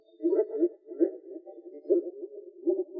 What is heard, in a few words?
Moor Frog